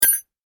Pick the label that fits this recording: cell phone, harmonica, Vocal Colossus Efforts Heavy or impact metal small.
impact metal small